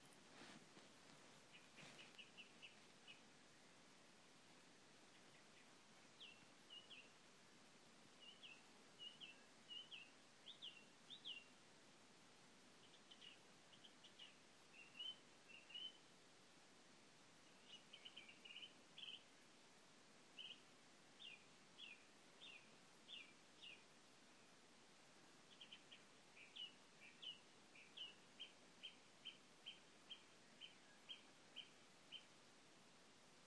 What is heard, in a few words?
dawn chorus